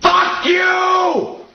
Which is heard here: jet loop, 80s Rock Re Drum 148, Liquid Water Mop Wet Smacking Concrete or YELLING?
YELLING